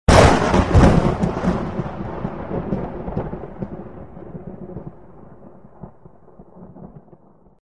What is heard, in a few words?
sound of thunder